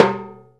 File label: CNTR STRK, Timbales; E-MU Producer Series Vol. 2 – More Studio Essentials; Composer; Timbales Xswitch